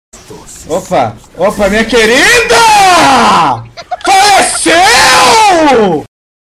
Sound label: aiuiiiiiiii